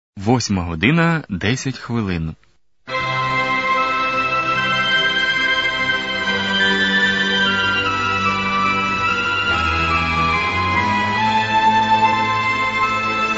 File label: interval signal; ur vinnitsa